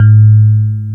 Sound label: KEY EP1 SFT, MKS20 + EP